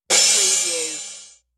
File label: Crash